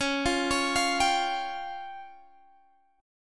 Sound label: Db Major Flat 5th Db down Guitar Half Step Down; chord shape